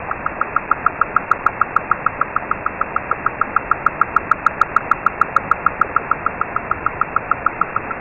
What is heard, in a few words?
x0.01speed amplified harbor porpoise